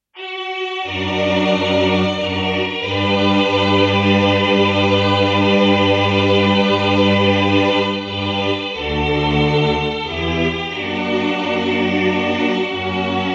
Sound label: String Drama; Dramatic Strings